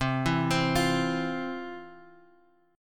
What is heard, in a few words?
C Major Add 11th, guitar chord